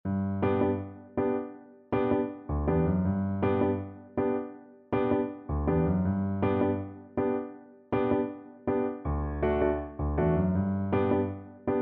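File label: VLC karaoke, Cello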